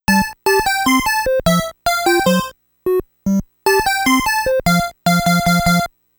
Stage Clear; Ninja JaJaMaru Collection

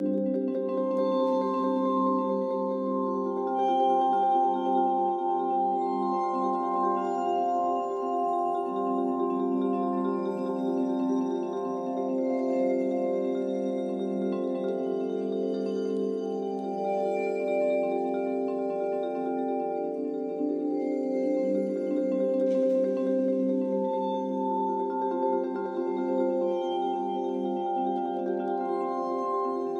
Menu Vibes; Smile For Me

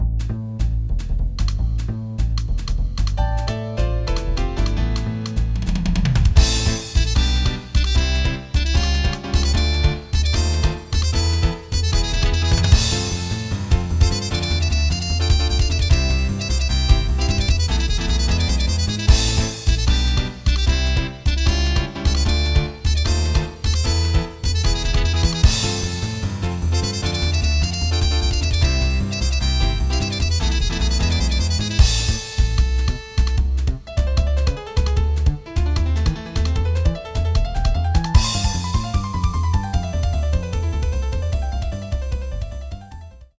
Jazz; Megaman Legends

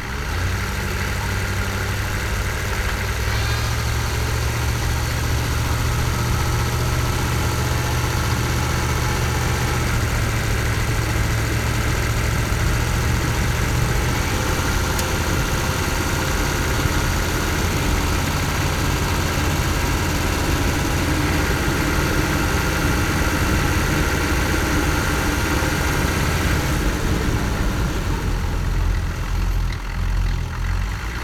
vehicle military drive; teardown; ps5 windows xbox series xs

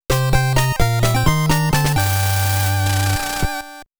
Game Over (ゲームオーバBGM); quarth soundtracks 2018

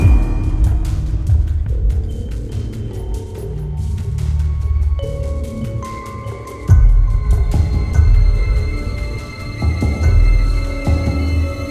Explore Suspense

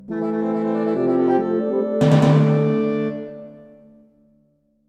Game Over Jingle; Itadaki Smash

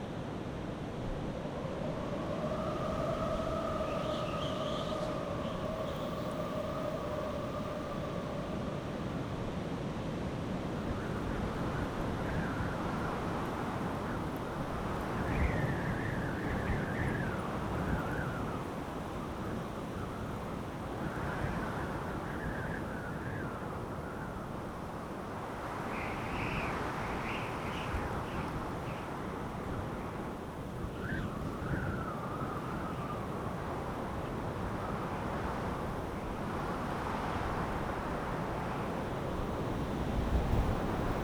outdoor wind

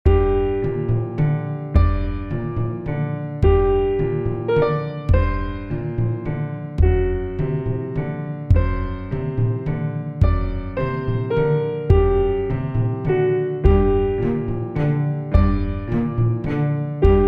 cathedral Loop

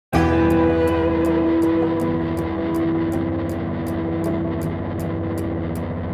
Exploration Bridge 160bpm; project zomboid; Keep Moving

